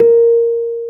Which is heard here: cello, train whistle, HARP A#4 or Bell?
HARP A#4